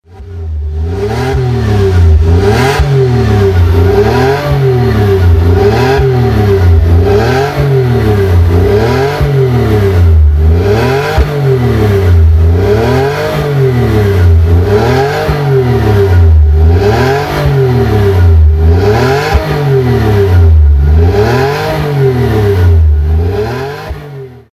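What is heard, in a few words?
JTS V6 spider blip, Alfa Romeo; unicorse-italial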